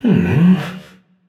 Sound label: sigh, voicepack; smite; jorm